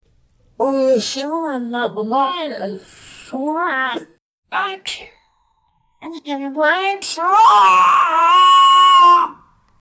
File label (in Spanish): milagro del nacimiento de, animals